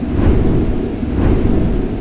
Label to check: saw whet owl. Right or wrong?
Wrong